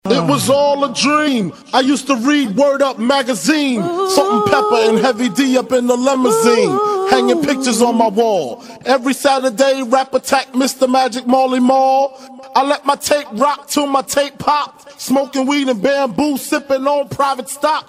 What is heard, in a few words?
acapella